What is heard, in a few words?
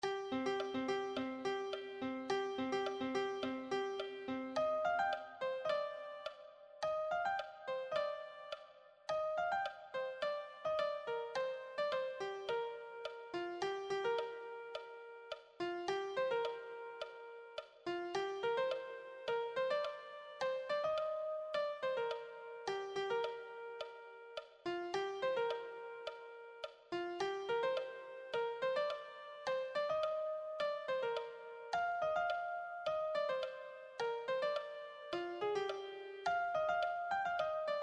melody